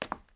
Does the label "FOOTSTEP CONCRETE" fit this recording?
Yes